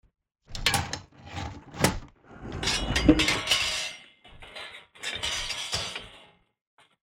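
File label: metallic mats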